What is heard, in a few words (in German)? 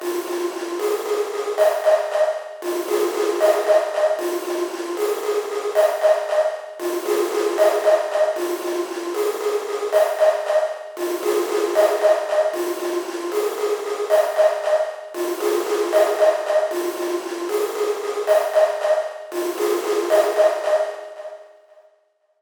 ASR10 Leisetrommel